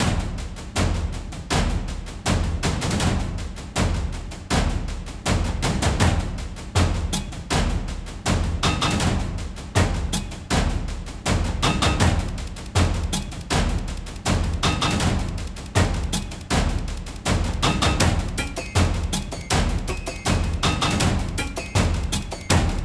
Percussion Loop

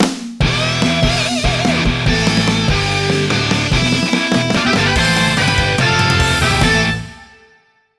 End Game Win; NFL Rush Zone; Leapster Explorer

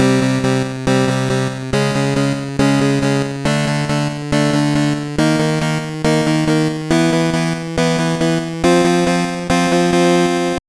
Ditty; NBA In the Zone 2000; GBC

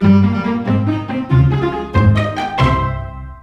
Level Success; Gloomy Manor

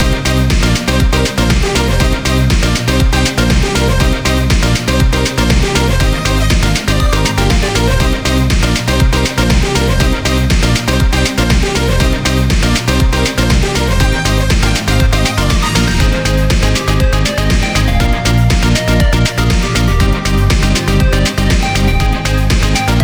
Stage Loop; Wave Circuit

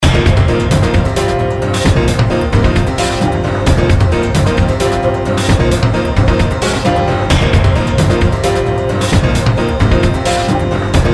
Raid; Mist Train Girls

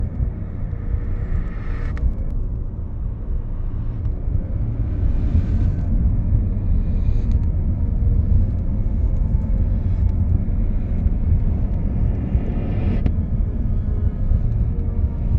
Ambience; content warning windows gamerip 2024

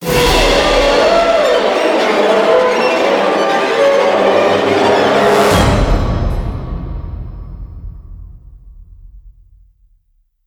String Large; dead space; ps3 windows xbox 360 gamerip 2008

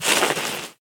Dirt Order; Prison Architect

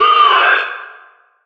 Guard Injured

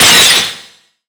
Object Building; BOMBERGIRL RAINBOW; Arcade

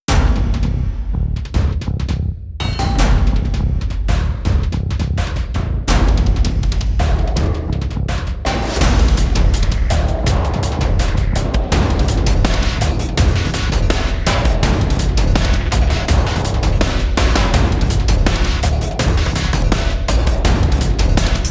Percussion; brawlout; Coc.Apocalypse

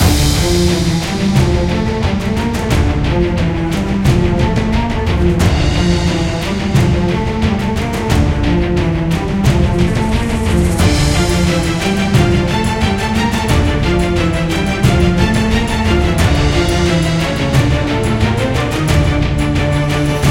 Boss Battle BGM Loop; AFK Journey; Android

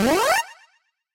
Jingle Develop Expup; Dandy Dungeon Legend of Brave Yamada

